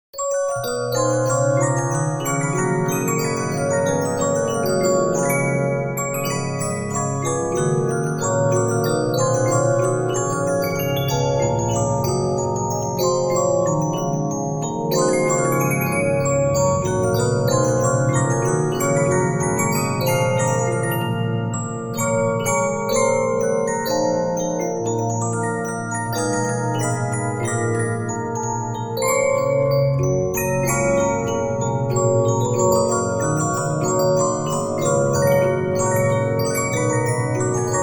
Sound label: Regina, 15inch disc musicbox